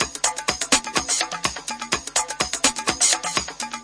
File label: garage percussion, drum loops and breaks